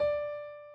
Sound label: key